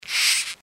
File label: giant rat pant; npc